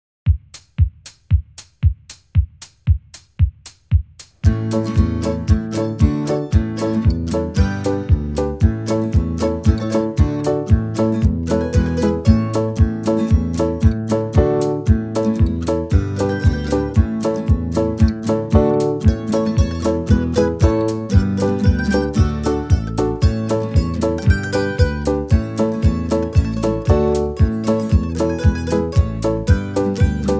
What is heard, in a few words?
key of a normal, banjo backing tracks, Old Traditional Irish reel; red haired boy